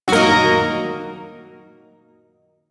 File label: Shawzin Chord C; DE; Warframe; Duviri Shawzin